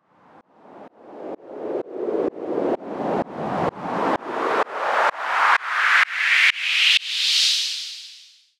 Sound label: Short Sweep, White Noise